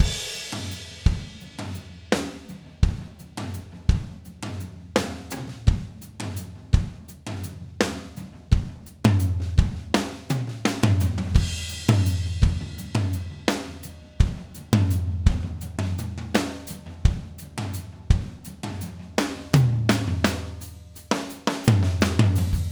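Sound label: tom beat, left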